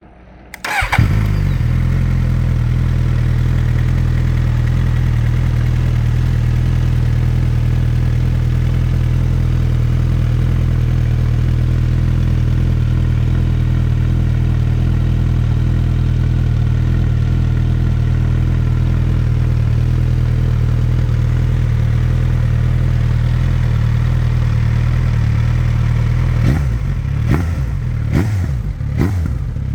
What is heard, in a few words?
Sound GSX-S 950